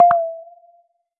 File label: battery low, phonetones Nokia N900